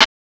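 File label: HI-HAT CLOSED; CMI Sound Library Rev 1.3; CYMBALS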